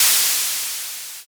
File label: spray